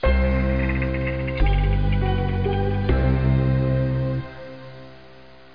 Ghost Stinger; Freddi Fish 2 The Case of the Haunted Schoolhouse

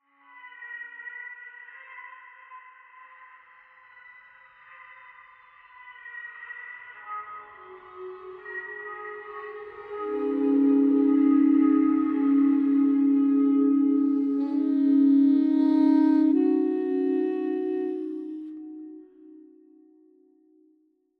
Pads and Toy Flute; Pads and Toy Flute Mood 4

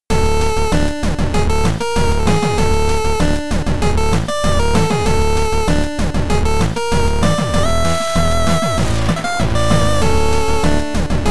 Chiptune; Tigris Too

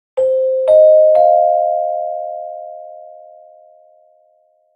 Marimba

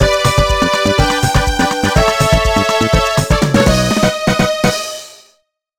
BGM Opening; Sam Power Footballer; NDS

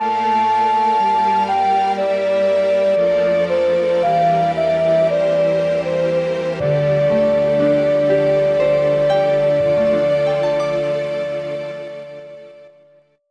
Defeat; front mission 2089 border of madness

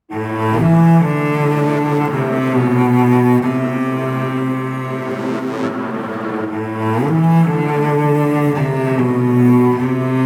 Boss Fight

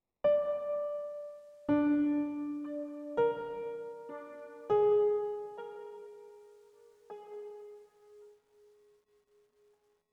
One shot Piano

